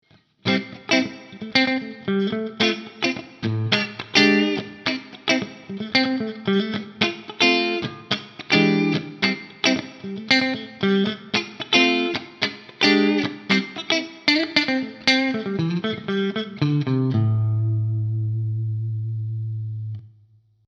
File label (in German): mitte funky